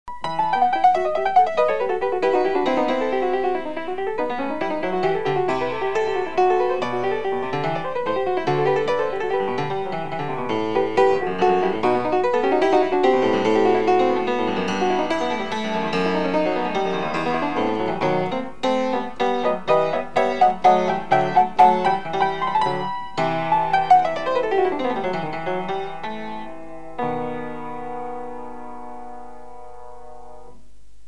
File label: courante